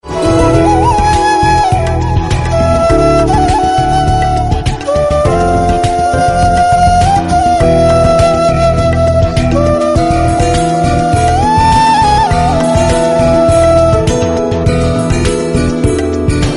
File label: flute music